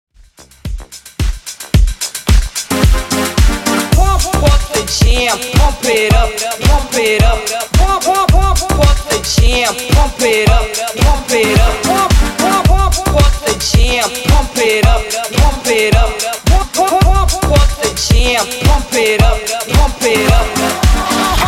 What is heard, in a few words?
redrum